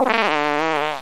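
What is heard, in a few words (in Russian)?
пукание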